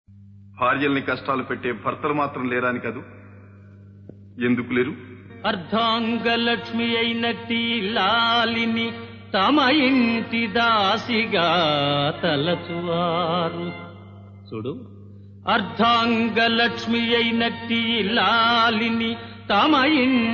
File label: Padyam; Chintamani